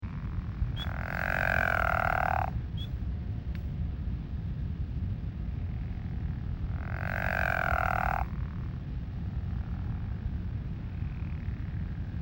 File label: Pickerel Frog; wildlife